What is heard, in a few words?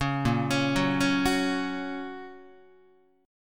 A sharp Minor Sharp 5th, down, Guitar; 4,6,4,4,5,5; arpeggiated; JGuitar